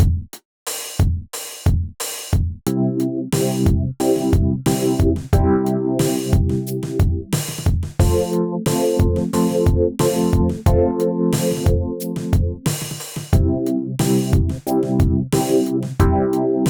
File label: c to f chord prog